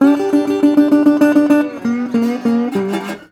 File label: 120, Blues Guitar; ILIO - Fretworks